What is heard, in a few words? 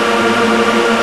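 A#4 bright, voish; Northstar - The Wizard VOL-1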